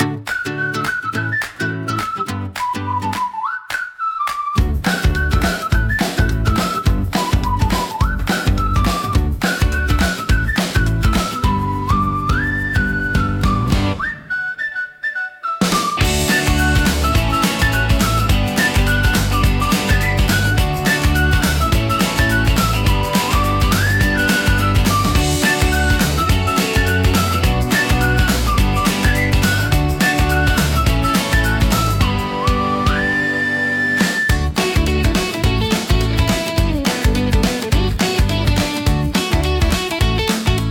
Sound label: Morning Door Swing